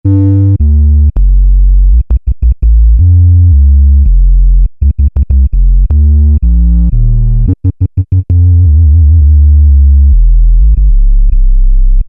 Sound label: Sub Bass, Alesis Micron; Factory Programs